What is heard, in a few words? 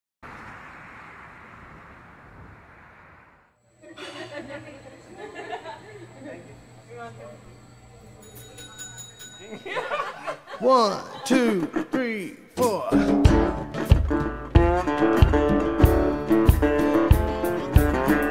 Truckin Song slide guitar